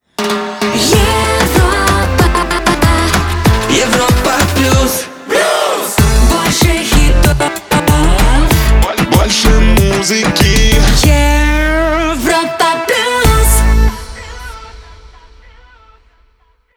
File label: Jingle; Europa Plus 2022